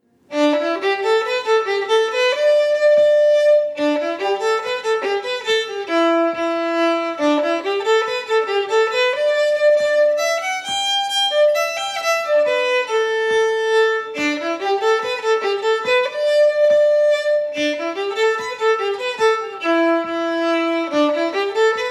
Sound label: G Tune B slow